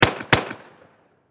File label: Warfare Pistol 45 Magnum Double Shot Close Perspective; Pistols